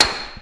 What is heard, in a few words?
Clap Concrete Hammer